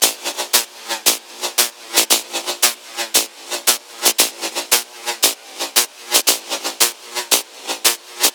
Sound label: 115 Perc Robot Hats; Percussion; Zero-G; Future Wave